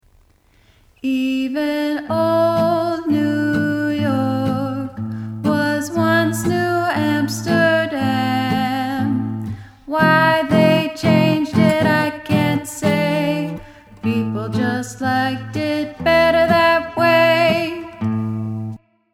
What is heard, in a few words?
chorus harmony